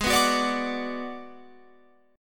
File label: G sharp Minor 7th Flat 5th G sharp down Guitar Open F; G#m7b5 strummed